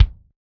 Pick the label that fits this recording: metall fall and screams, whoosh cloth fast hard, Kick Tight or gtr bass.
Kick Tight